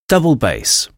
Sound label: double bass